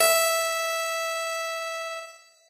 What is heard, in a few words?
piano; pianojs